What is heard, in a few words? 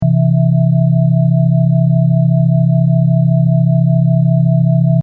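C3 E3 E.T. With Overtones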